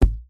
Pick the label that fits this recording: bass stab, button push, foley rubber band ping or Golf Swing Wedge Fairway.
foley rubber band ping